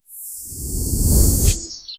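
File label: a sleek arrow glides gracefully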